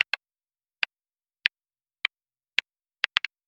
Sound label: short crisp, button click